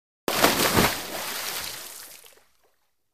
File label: Swimming Racing Div